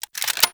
Reload, Weapons; Warframe; Boar

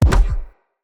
Bow Shot Charged Punch; Weapons; Player; Josalfar Bow

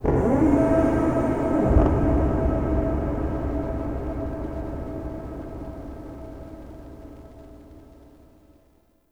Dark Texture, Ambience; Warframe; Shadowgrapher

